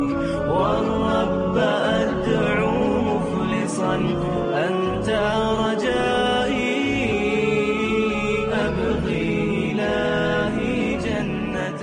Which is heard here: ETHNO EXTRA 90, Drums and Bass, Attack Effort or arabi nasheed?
arabi nasheed